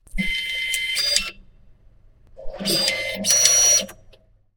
car garage ticket automate barrier

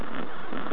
plasma, weapons

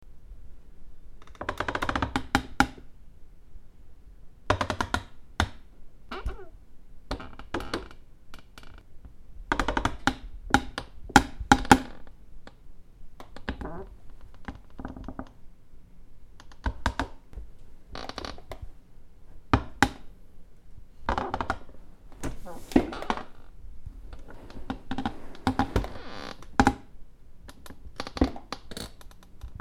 chair jarring

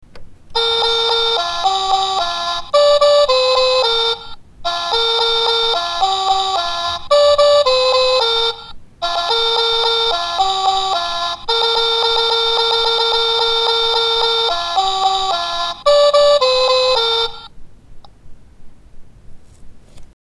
baby toy old mc donald

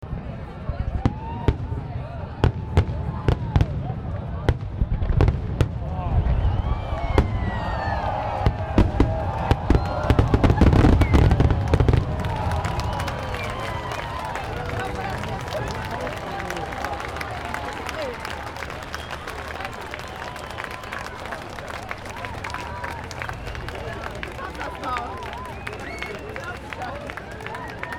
fireworks end of act with applause

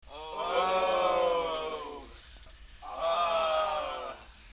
ohhh ahhh

